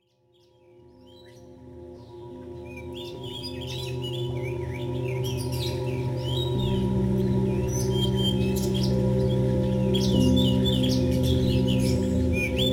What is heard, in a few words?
Birds